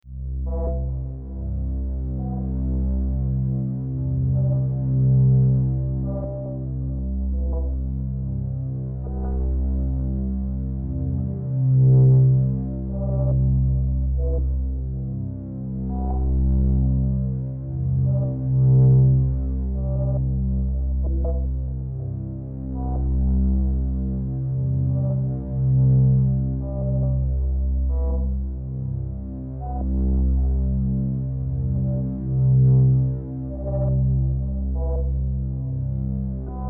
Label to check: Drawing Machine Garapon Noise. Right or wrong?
Wrong